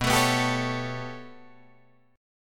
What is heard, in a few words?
B 7th Suspended 2nd Sharp 5th down Guitar Drop D, chord